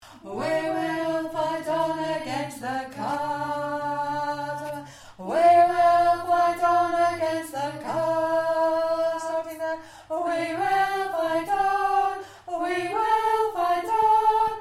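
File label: soprano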